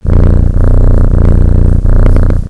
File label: purr